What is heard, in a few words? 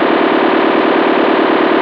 paknet, scanner